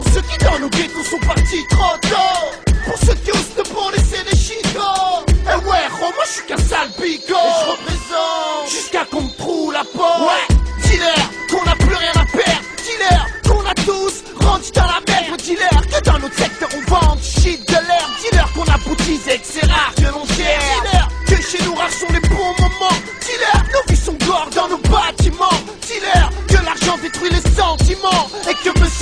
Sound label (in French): Rap francais russe